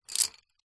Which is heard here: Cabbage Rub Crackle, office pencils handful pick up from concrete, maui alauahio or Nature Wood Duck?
office pencils handful pick up from concrete